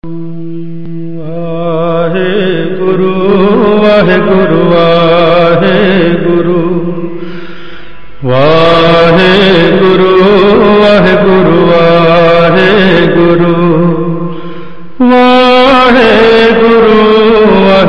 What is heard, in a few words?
Waheguru Ringtone